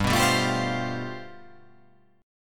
G 11th

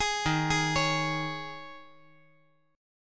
D sharp 7th Suspended 4th

